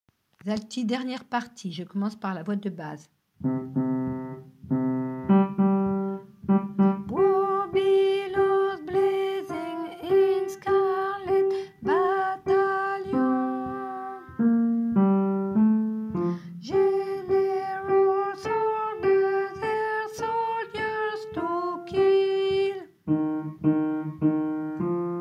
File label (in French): alti partie